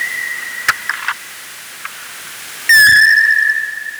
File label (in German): grosser abendsegler